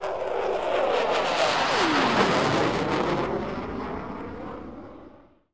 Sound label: fighter pass